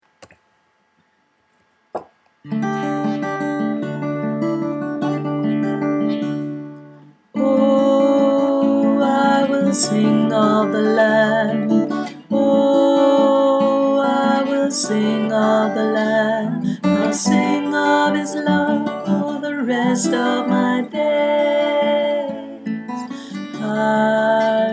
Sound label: tenor